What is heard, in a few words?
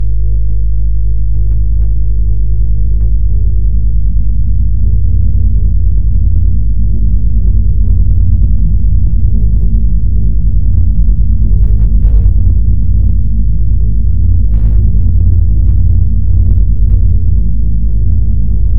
Night Ambience; Jolly 3 Chapter 1